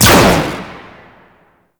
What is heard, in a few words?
Jet Turbine Pistol Shot; Warframe; Tenno